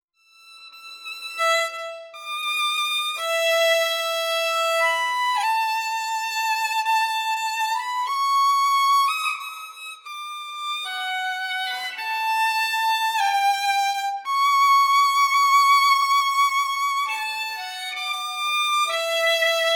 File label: sad violin with nostalgic; emotional